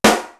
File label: ARID SNARE; East West; Global Sounds